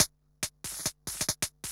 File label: HIHAT TECHNO 140, Techno Drum; Techno2